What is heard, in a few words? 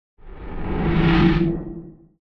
Swipe